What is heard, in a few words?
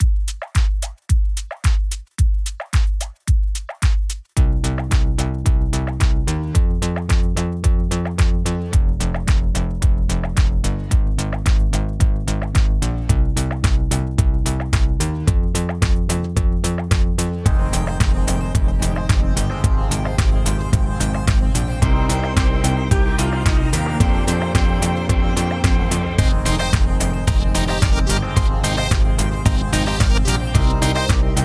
Dancy Beats